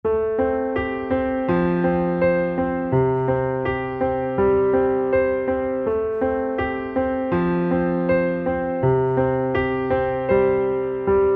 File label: Underscore PIANO; Worship Flow